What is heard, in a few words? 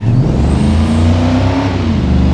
first, vehicles